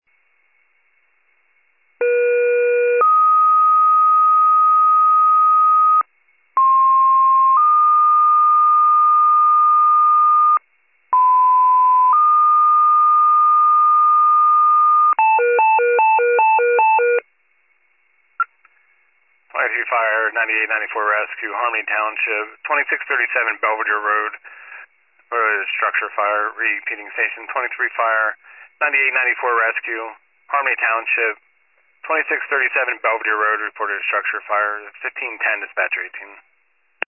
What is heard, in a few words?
fire, pager alert